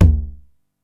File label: TOM M, 909; musicradar-synth-samples; Classic drum machines; 909TOMS